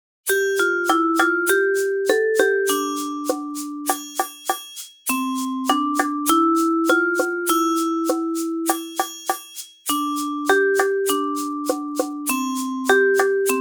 Percussion Ensemble; Lotus Root Chicken Patty

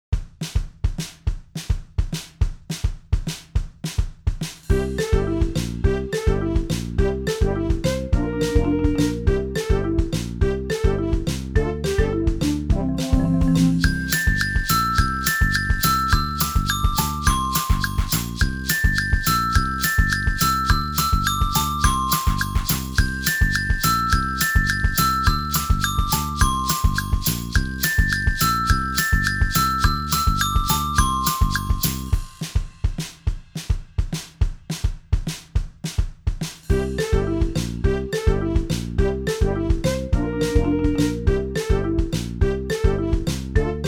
CHIME BARS with ACCOMPANIMENT

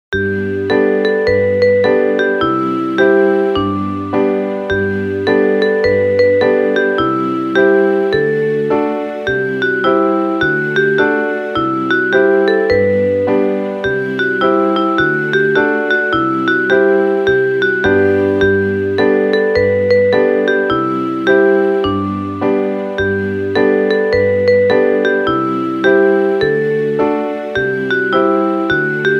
piano ensemble

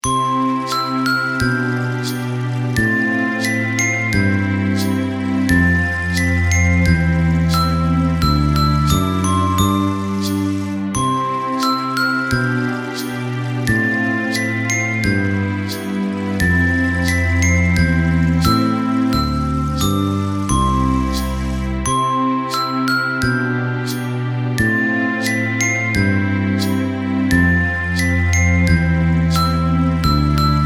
Beautiful Star CHIME BARS ONLY

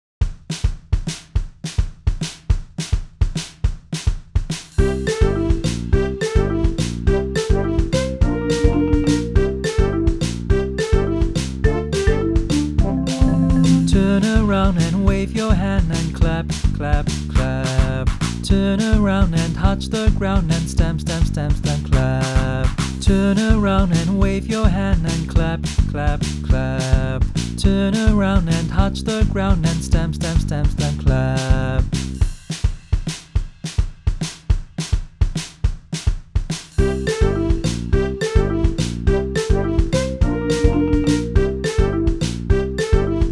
singing funny dance fast

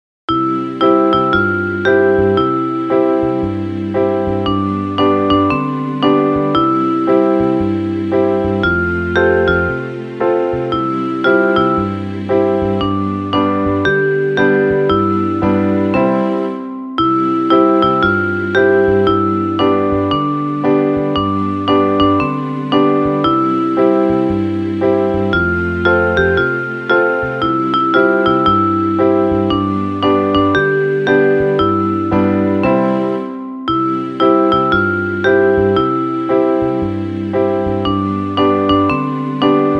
Piano Ensemble; Unit 5 Japanese Style Burger Steak

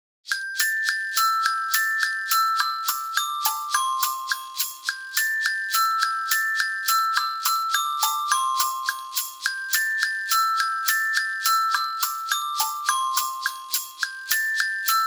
CHIME BARS ONLY

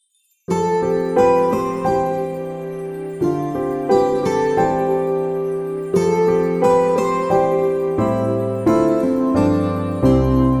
Beautiful Star CHIME BARS with ACCOMPANIMENT

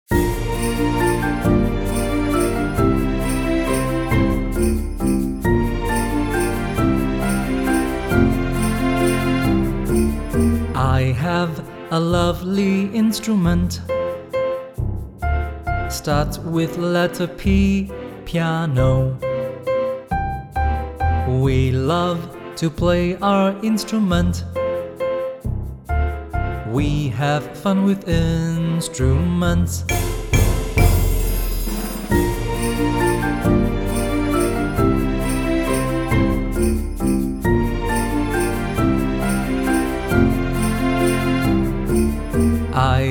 Singing Let's Play Our Instruments Slow

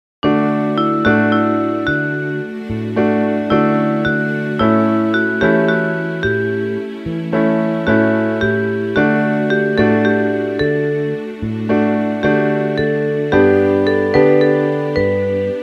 Piano Ensemble; Unit 8 Pumpkin Cupcakes

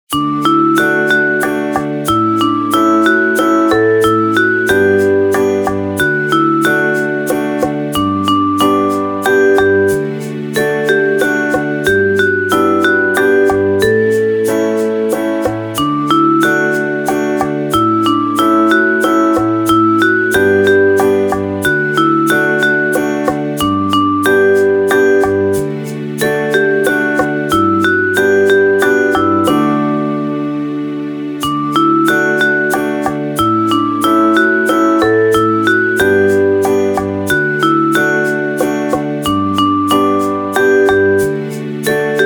Full Ensemble

